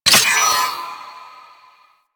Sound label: Lightning Rod Charge; Warframe; Ambience; Corpus Gas City; Gameplay Remaster